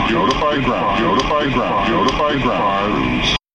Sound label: notify